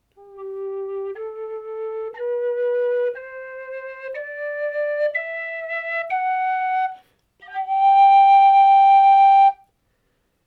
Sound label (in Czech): Pastýřská píšťala G